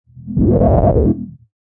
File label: Muddy Laser Goo; Laser Sound Sound Effects; science fiction sound effect